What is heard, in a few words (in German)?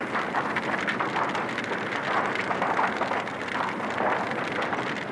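Waldbrand; EMERGNCY; Emergency Demo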